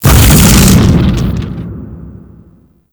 Field Frag Grenade Burst; Warframe

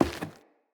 Grunt Step Wood, Footsteps; Enemy; Characters; Corrupted Sproutfolk

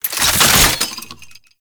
Wrist Gun Reload; Warframe; Tenno; Incarnon

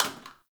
Janitor Plastic Spray Bottle; Ambience; Gameplay; Warframe; Nineteen Ninety Nine

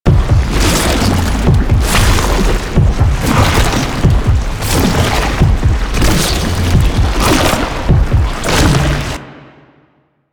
Passive Loop; Enemies; Abilities; Warframe; Proto Infested; Babau

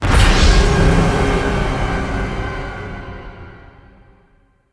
Organ Stinger First Vent; Encounters; Ode Pollution Tower

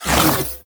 Ice Weapon Beam Retract; Warframe; Corpus; Corpus Railjack; Spec Ops Ice Moa

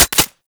Uzi Mech; Warframe; Tenno; Prime Tenno Uzi; Weapons

